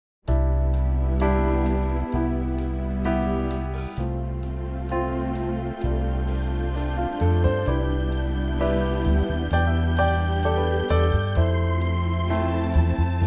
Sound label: C orchestral